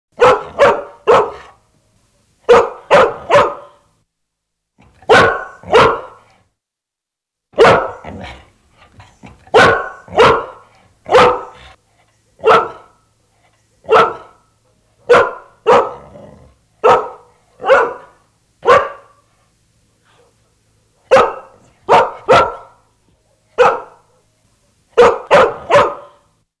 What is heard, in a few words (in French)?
chien